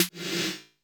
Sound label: SFX S808; Masterbits - Soniq Elements